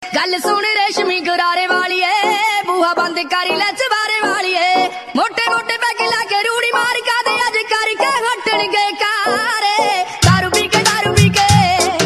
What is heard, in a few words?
punjabi ringtone